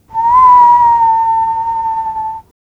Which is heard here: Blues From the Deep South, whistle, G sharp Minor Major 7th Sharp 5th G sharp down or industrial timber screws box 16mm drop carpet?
whistle